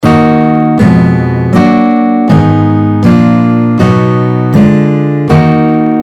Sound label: D Sharp harmonic minor chord scale, guitar